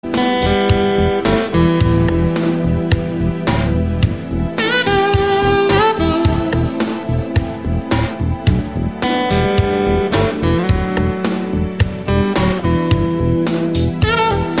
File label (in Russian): Саксофон и гитара; рингтон